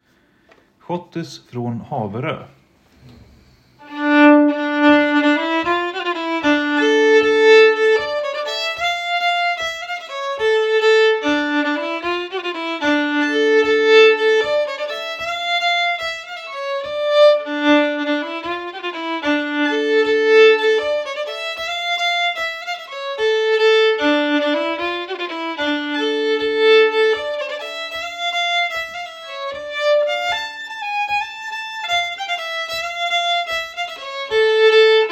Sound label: Schottis; Schottis från Haverö